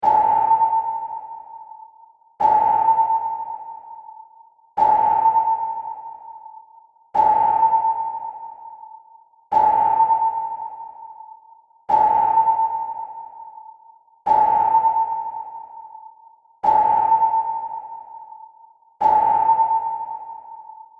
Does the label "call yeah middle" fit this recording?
No